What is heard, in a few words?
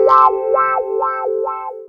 Clavinet; Ambient Grooves